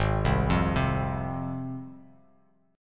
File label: G sharp 6th G sharp down, chord; arpeggiated; JGuitar